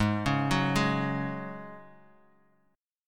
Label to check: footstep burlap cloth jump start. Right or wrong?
Wrong